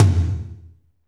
TOM, Kit Dry; Northstar; Drumscapes Roland

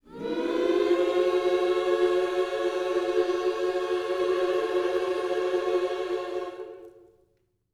MINOR, Gospel; Vocal Planet Vol1 Gospel

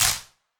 Wider Clap

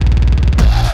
Kaos FX, Ambient Grooves

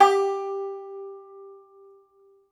Tenor Banjo, Plucked Strings

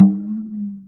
GLISSENDO; Univers Sons - Raricussions

